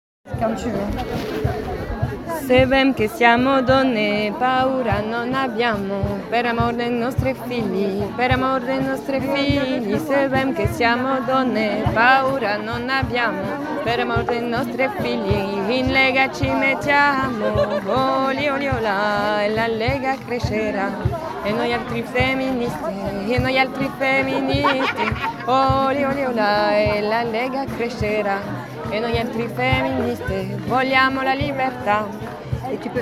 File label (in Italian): La lega voix basse